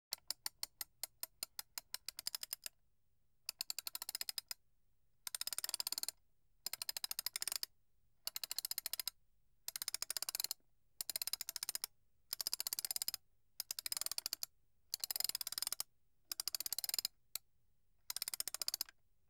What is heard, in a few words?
Wind-Up Clock; BBC Sound Effects Library